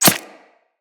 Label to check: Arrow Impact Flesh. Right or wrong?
Right